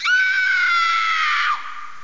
screaming (female)

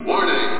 warn; com!online 2002 April; Sun Microsystems Audio

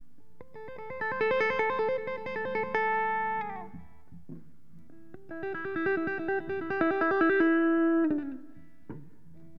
Guitar, Acid Jazz Funk; LiveAct Pool

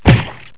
SPLAT; The Ultimate Guide to Mortal Kombat

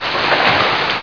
swim

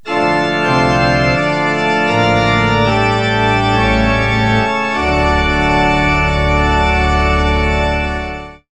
org brid, pianos; music; KABOOM! - Wild and Wacky Sound Software

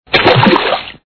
Drawned; snowline

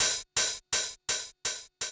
DRUM SHOT HOUSE 125; eJay; Singleshots

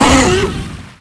Blood Splat; Defiance

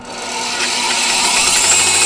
rev up; The Rift; Throughwave Technology

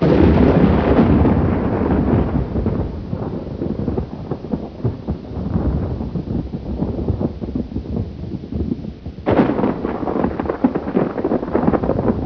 thunder

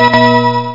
TADA; Strategy Companion; guess

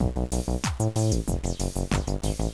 groovy; Querkz 1.5

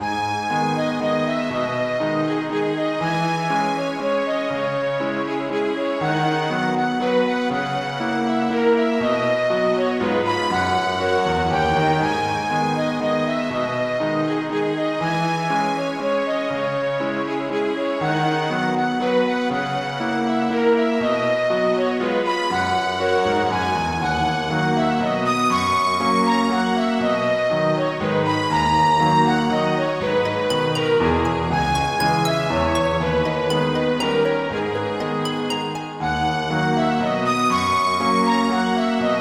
waltz

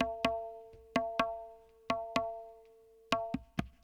PERC LOOP ETHNO 125

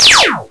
shoot

